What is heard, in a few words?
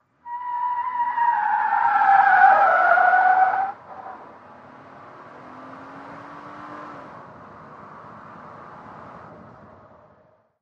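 SUV Truck Accel Peel Out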